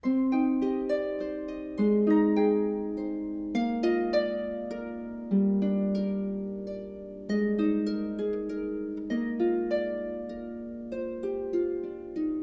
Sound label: Harp Selections